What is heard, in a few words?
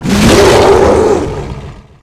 golem attack